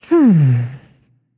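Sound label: thinking, female voice; smite; voicepack; hera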